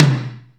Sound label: heavy t, Yamaha RX-5